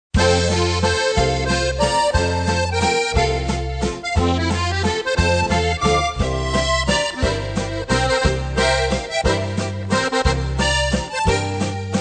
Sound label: waltz